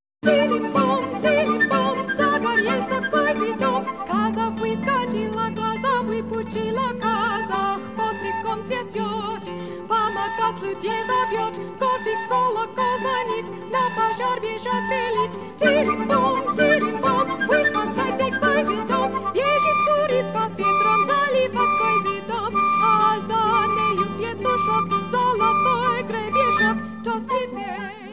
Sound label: Four Songs for Voice, Flute, Harp; chansons